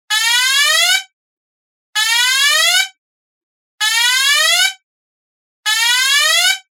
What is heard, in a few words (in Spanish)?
tono; S 442